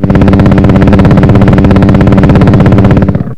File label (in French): helico; DoodleJump